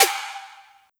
pop snare; osu

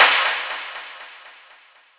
boom

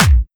soft hit clap

